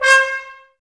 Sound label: BRS TBSC, Brass, Tbs Mute; Miroslav Vitous - Woodwind Brass Ensemble